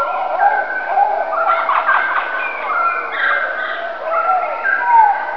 JUNGLE; Omgeving

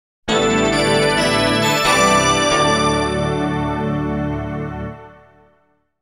Station Call; Sounders, Bumpers, CUES & IDENTS; DennisMusicLibrary

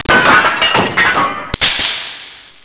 SMASHED, breken

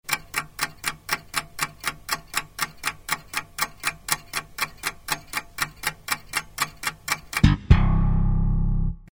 clock tic-tac; Rosegarden

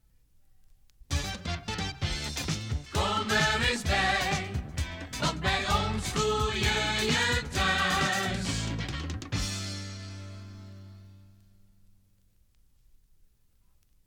topformat maxi jingle single

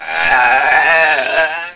SHEEP; sound effect; Dieren

